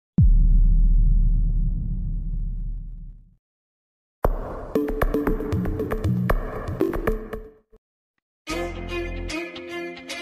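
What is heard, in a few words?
Tamil love background music ringtone; sarvam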